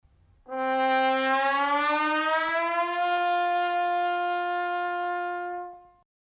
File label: trombone glissando; strumenti